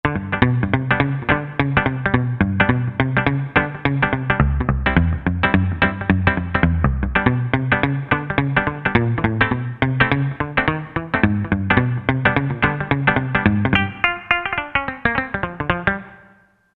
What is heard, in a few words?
Mute Pop Gtr, Roland SR-JV80-17 Country Collection